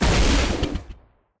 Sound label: Jumpjet Jet Start Flame; Titanfall 2